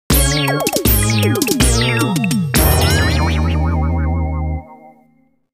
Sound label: Failed; Taiko no Tatsujin Doka to Oomori Nanadaime; PS2